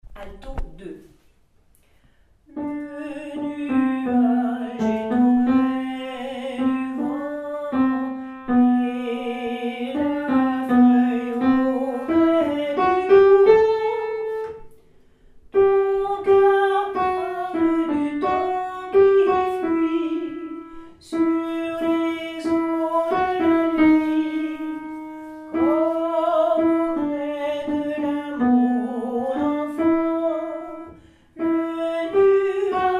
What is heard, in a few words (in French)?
berceuse alto 2; Berceuse pour julie